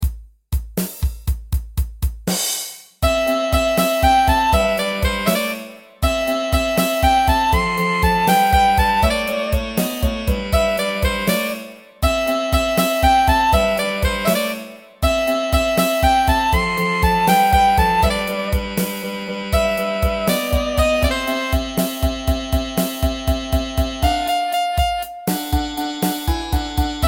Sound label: rainy blues